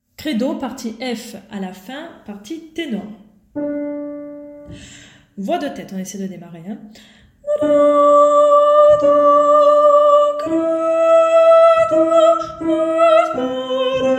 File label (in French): partie tenor; Credo f à la fin